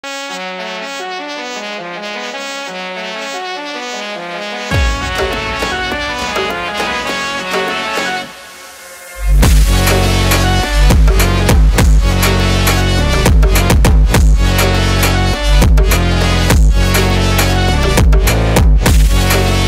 Phonk Mexican Phonk

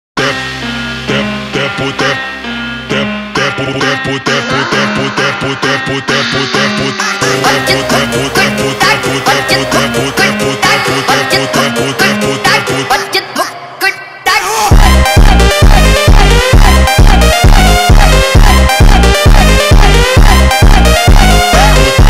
FUNK

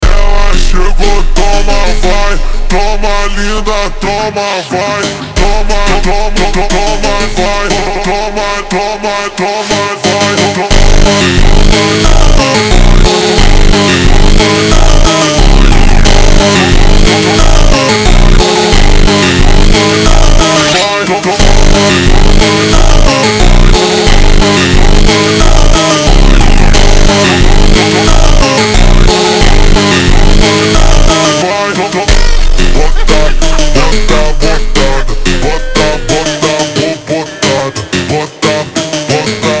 Phonk MONTAGEM Extreme Slowed

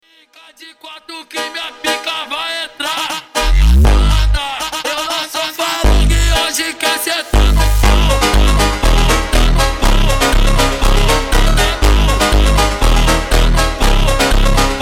Phonk MONTAGEM Super Slowed

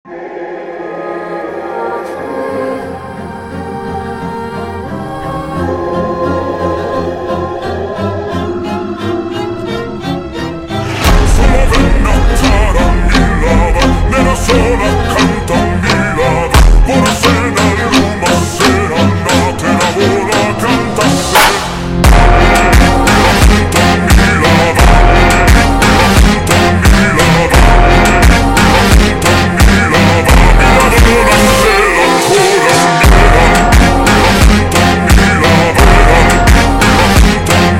Phonk, Ultra Slowed

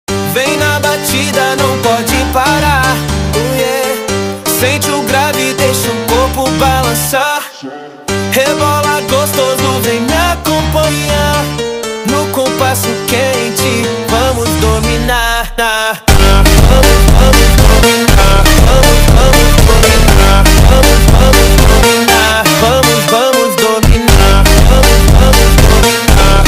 Phonk

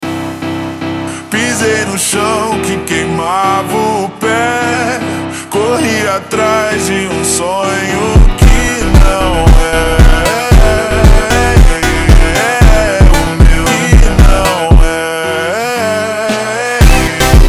Phonk, Super Slowed

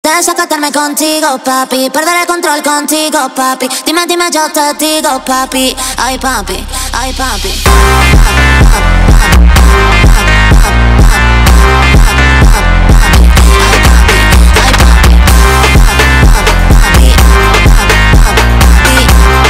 Phonk

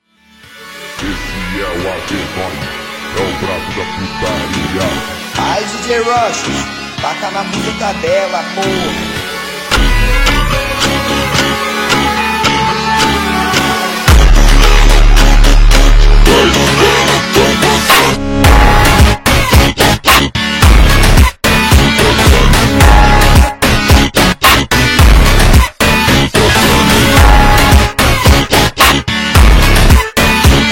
Phonk MONTAGEM Slowed

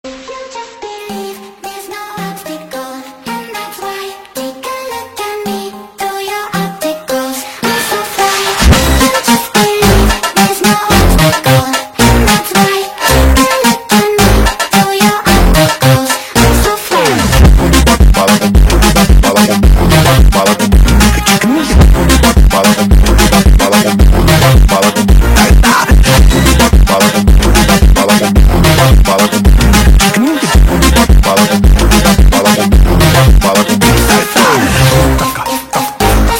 Phonk

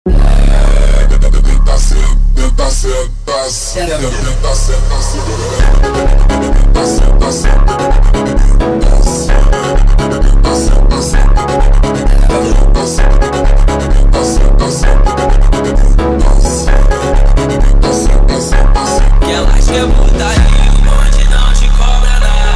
FUNK

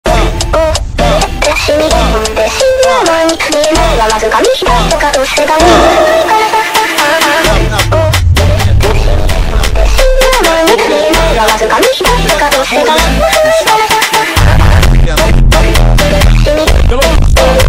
Phonk funk

